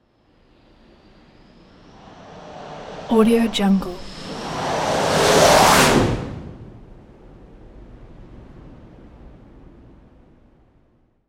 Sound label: fa 18 hornet jet arrested landing; sound-ideas